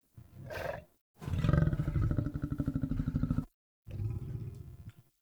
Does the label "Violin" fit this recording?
No